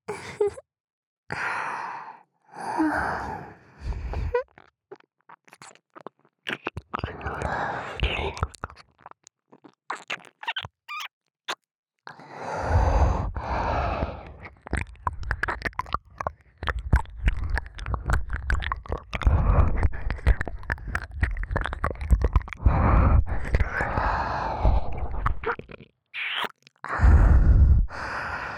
耳舐め(NR済・SEなし); エロかわJKと子作りえっち♪

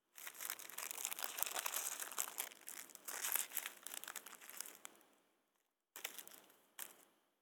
手持ち花火を包装ごと手に取る; 夏実と縁側花火デート

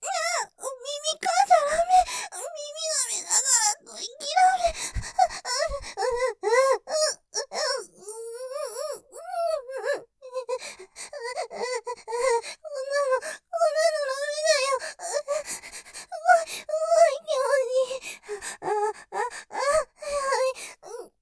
喘ぎ お耳舐めちゃだめぇ 吐息らめぇ ふやふやに; ステレオ

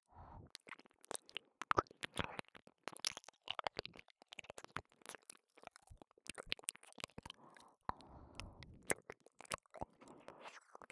耳と首筋を舐める（左耳）; せいえき☆検査病棟

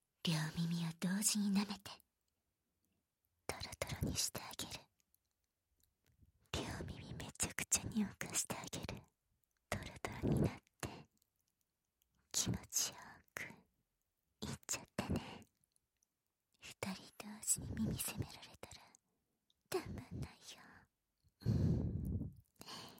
両耳舐め

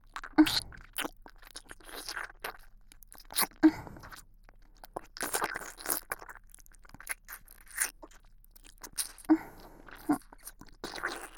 耳舐め 鈴音 (左右), ASMR

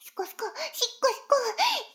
シコシコ音声; 逆時間停止されて

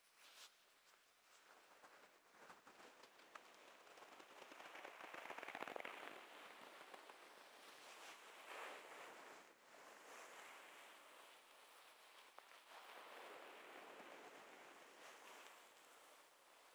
両耳をタオルで拭く

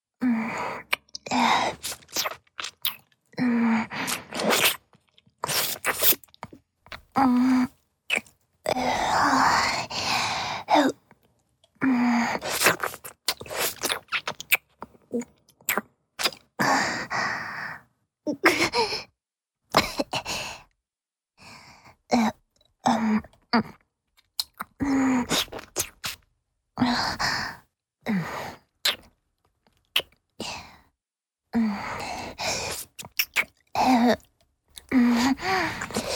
チュパ音, 高音抑制; フェラチオ(弱):亀頭を控え目に舐める

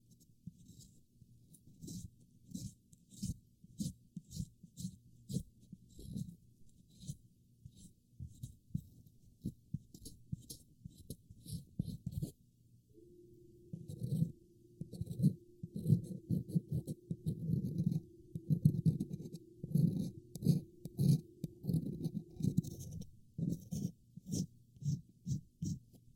耳かき 右; 癒しの旅館 ゆらぎ亭

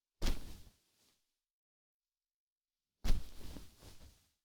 布団を跳ね除ける; 3DIO; 布団の音

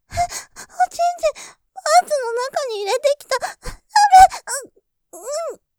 喘ぎ, ASMR; おちんちんがパンツの中に！やばい！！; ループで楽しむ！シェフの気まぐれ抜き抜きコース; モノラル

